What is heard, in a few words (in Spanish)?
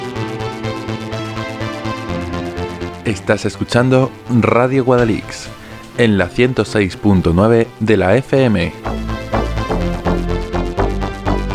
cuña dial rythm